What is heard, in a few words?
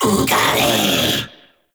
summon vocal; Warframe; Uriel; Vythelas